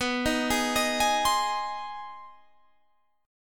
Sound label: G sharp Major B; arpeggiated; JGuitar